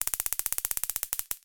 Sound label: warfare taser gun